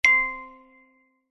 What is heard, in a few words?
alert bell ping wooden